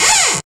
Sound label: XTRA PERC